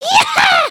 celebrate

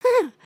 dance; emotes

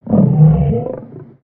Pet Trike Growl; creatures pets

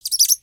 Pet Rabbit Speak; creatures pets

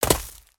Grass Landing, footsteps

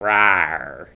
Dragon Emote

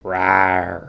Dragon Rawr; emotes

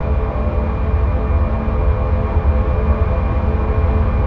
spaceship loop

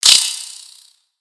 Spider Attack Ranged; Lego; creatures mechanical

